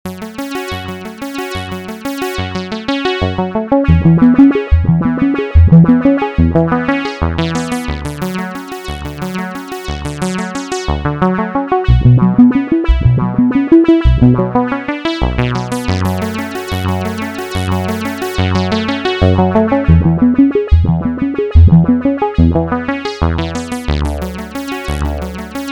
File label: analog performance dry; soliton; chiral audio